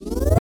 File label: spawn; Golden Crayon Sounds